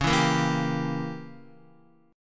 Db Diminished 7th, Guitar; strummed; chordshape; JGuitar

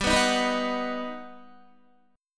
G Augmented Major 7th, Guitar; G+M7 strummed; JGuitar

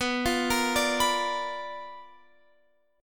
B Minor Major 7th Flat 5th Guitar Open F; BmM7b5; arpeggiated; x,18,17,17,17,18

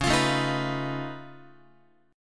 C sharp Augmented 9th, C sharp, down, Guitar; strummed; 4A,4E,5A,5D,5G#,6C#; C#+9; x,9,8,7,7,10; JGuitar

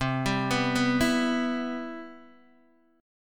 C Major 7th, Guitar Open D

